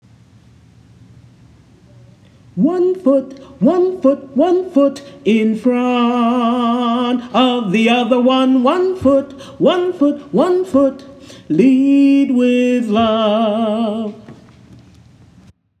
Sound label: One Foot Chorus high part; OaxacaCanta